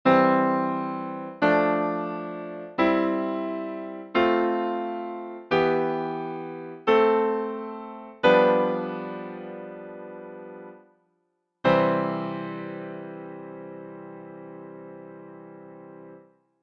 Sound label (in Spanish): Do mayor armonizada